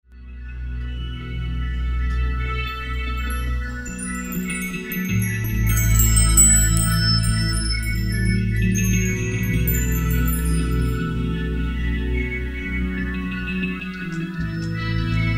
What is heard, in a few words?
Saturns Hurdy Gurdy